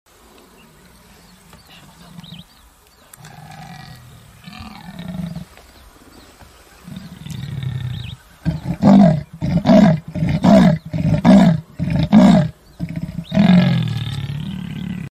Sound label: Clouded leopard; پلنگ ابری